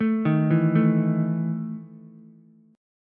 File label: E Major 7th Suspended 4th, Ukulele; arpeggiated; JGuitar